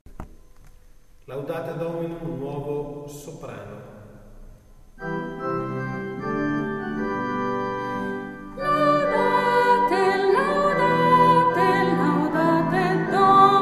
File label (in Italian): Laudate Dominum nuovo soprani; Laudate Dominum (scambio di pace)